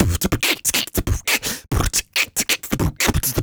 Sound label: Human Beat Box 140 Pop; Loopmasters